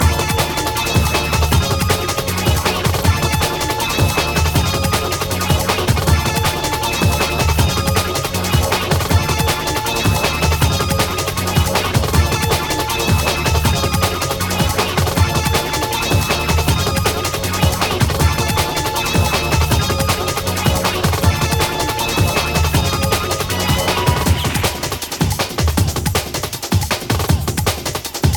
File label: drums of doom